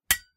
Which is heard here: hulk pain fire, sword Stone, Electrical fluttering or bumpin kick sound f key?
sword Stone